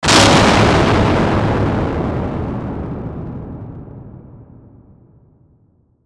Hit Airy Distortion Reverb; Production Element Imaging Element Accent Transition

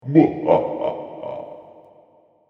Tyrant Laughter, evil laugh; fantasy sound effect

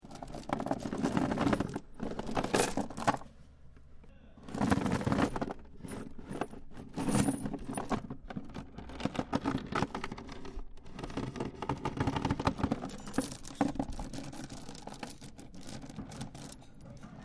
Chains Foley Lots Of Chain Movements; Metal Sound Effects; LYN103 package of Chain Sounds; Lynne Music

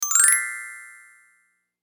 Pixie Dust Bell Scale Ascend; Magic; Blastwave

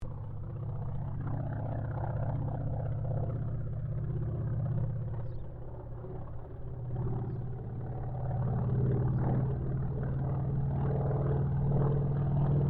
AIRCRAFT CAC WIRRAWAY PASS

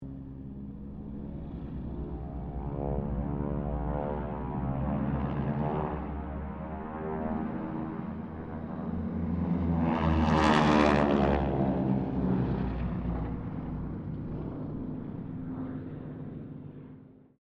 Harvard squadron 4 pass, stereo; Mixed aircraft squadrons; Airplanes; Sound Effects:Vehicles

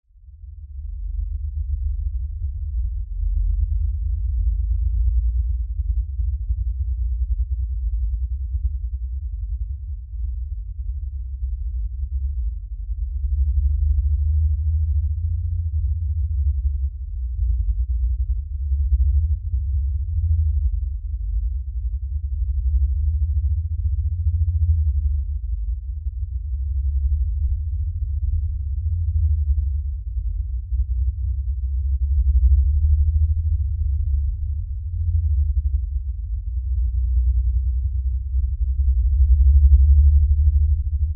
LFE Rumble, Lfe Rumble Low Bass; Rumbles; Blastwave; Sound Effects:Sound Design

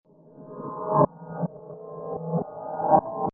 Metal Rev

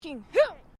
FEMALE EXCLAIM; Exclamation; Voice

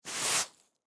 Broom Sweep Ice, Snow; Weather

